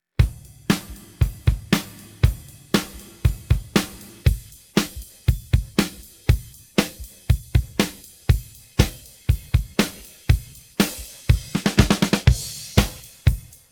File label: drums pattern